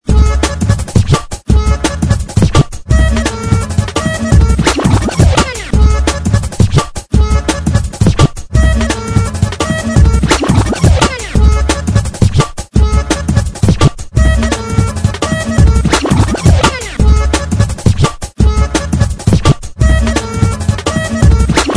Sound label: merry accordeon dnb scratch mix